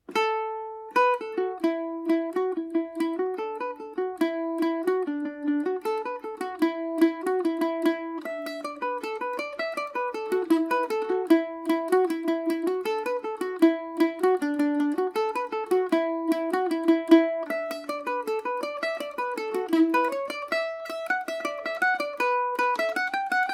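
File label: E Dorian, Irish mandolin; Winnie Hayes’ Jig; Paris Swing Macaferri mandolin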